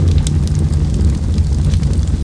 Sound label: FIRE; Urban Chaos